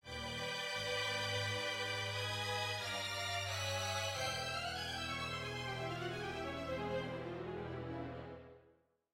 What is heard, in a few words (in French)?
chute orchestre